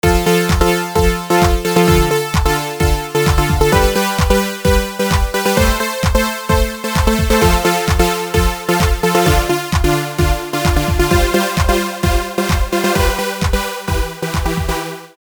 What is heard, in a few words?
supersaw osc